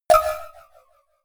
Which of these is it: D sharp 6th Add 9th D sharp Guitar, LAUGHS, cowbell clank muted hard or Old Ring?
cowbell clank muted hard